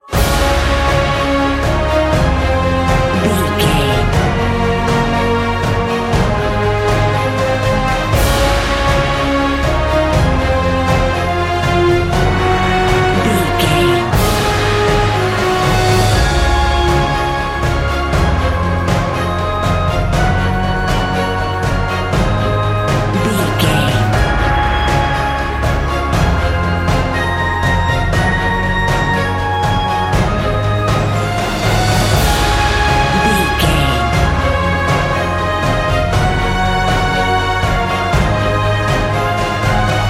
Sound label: sunshine morning cinematic corporate